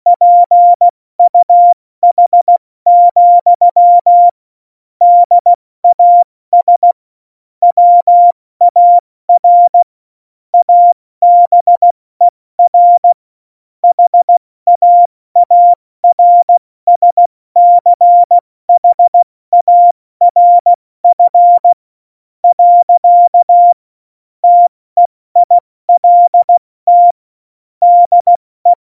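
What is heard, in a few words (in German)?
Witz, Morsekurs, cw morsen; Witze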